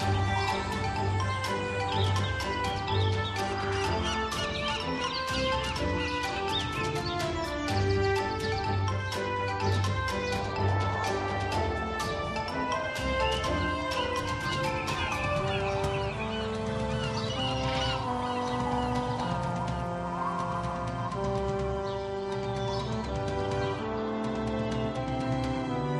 Map Hub Theme Amb; Puffle Wild

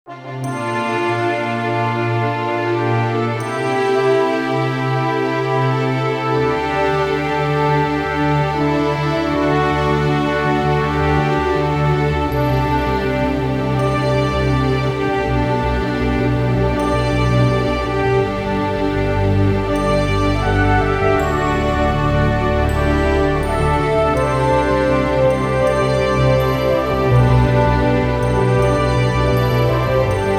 Victory Sequence; thorium wars attack of the skyfighter; 3ds

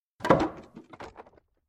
Plank; Decay: The Mare

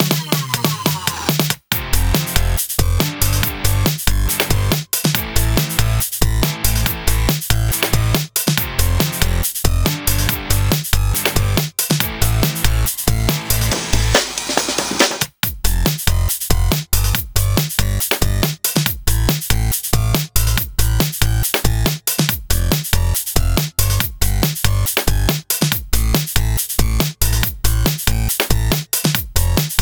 Banjo no lead

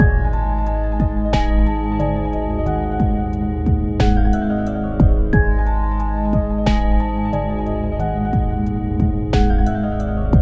Story at Night (Short Loop); Dragon Cliff

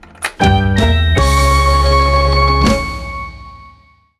Extreme Levels Unlocked; Run Roo Run

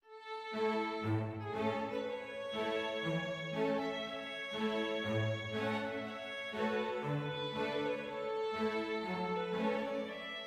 happy med

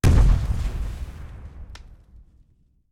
Explosion Bass

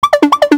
KEY Hold Loop

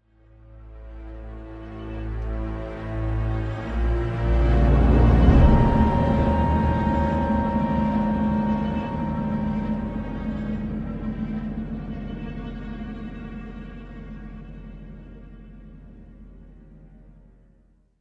Suspense Theme

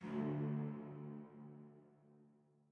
chime old; chime sharp

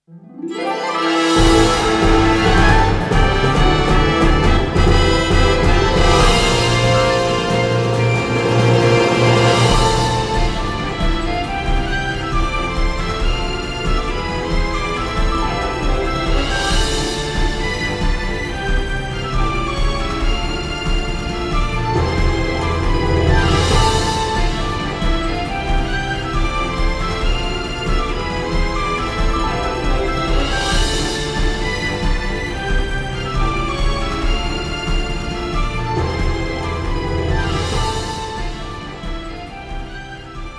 Victory March; Chaos Rings; Chaos Rings Original Soundtrack

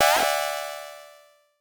Warning

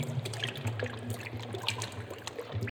SFX water puddle verb; Adam's Venture Origins

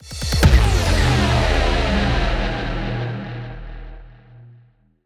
lose

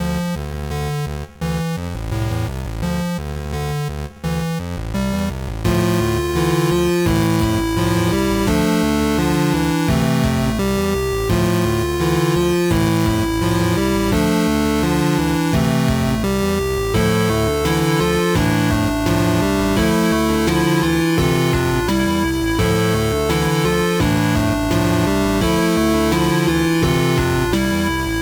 Toccata; timothy and the mysterious forest ps4 switch windows gamerip 2019; Toccata Lungo

